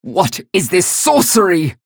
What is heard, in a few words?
pain ability damage; galena; unholy paladin; low health